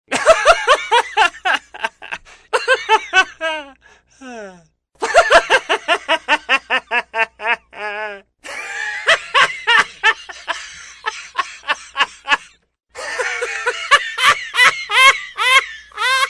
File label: laughing and laughing funny ringtone